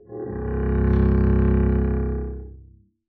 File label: arco c1 ff up, double bass; dsmolken